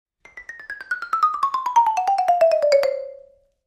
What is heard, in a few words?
Xylophone Lng Descen